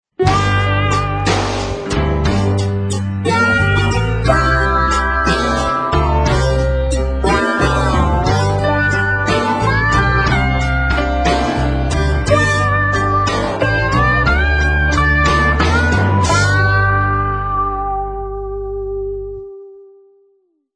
blues ringtone

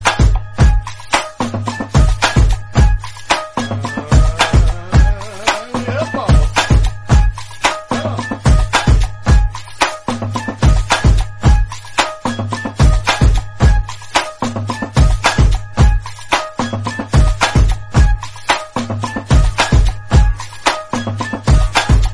famous english song instrumental tone ringtone

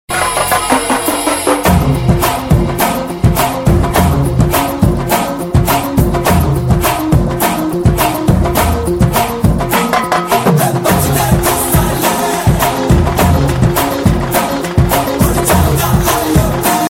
telugu theme ringtone

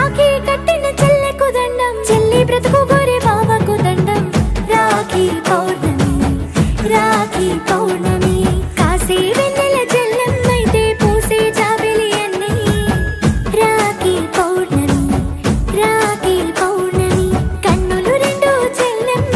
music telugu ringtone

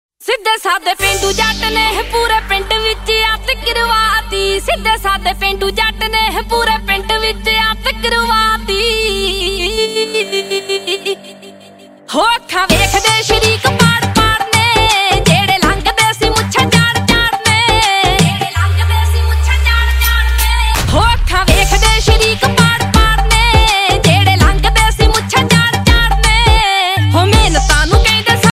new punjabi song ringtone

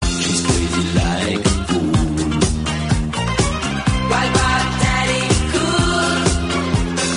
English song tone ringtone

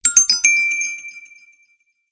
realme gt neo 3 sms ringtone

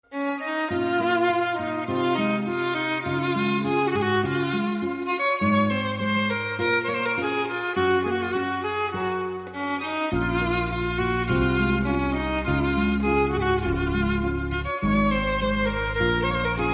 instrumental song tone ringtone, Bollywood

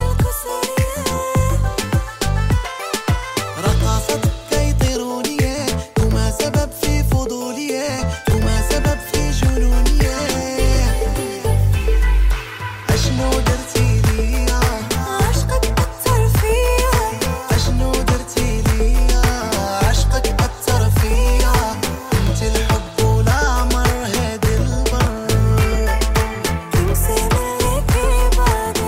remix arabic new ringtone

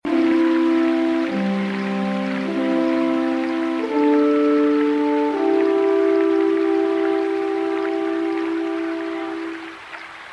waterfall peaceful background music tone

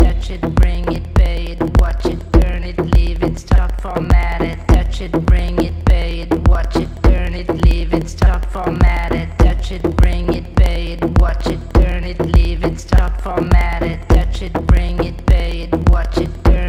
sweet music ringtone

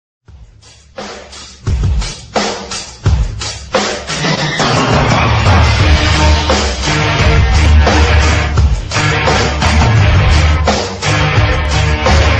instrumental ringtone

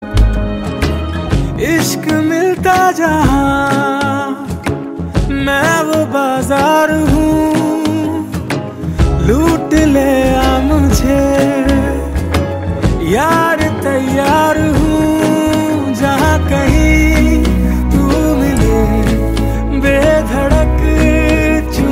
love song tone ringtone, bollywood ringtones

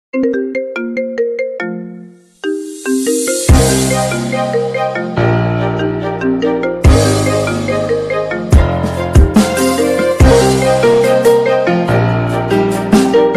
iphone ringtone

